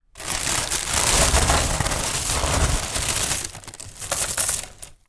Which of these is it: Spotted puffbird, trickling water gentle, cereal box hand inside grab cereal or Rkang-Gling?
cereal box hand inside grab cereal